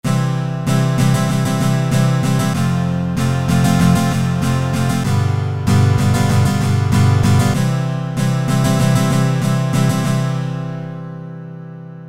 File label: guitar